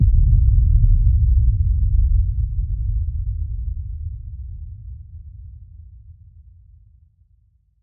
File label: Hit Low Roar